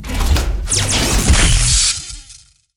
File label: cannon atk, monster